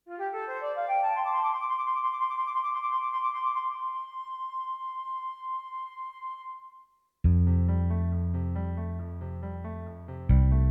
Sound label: minus drums